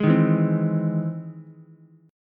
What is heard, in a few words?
E Augmented Major 7th E down Ukulele; strummed; E+M7; JGuitar